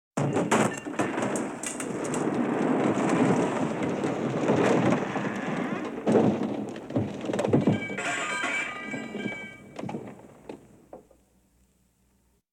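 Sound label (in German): Katastrophen Fallende Gegenstände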